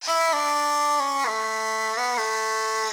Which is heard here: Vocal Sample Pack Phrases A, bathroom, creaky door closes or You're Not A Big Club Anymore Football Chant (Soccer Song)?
Vocal Sample Pack Phrases A